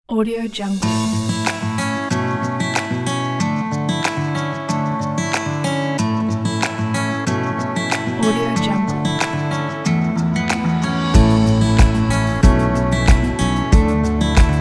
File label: acoustic guitar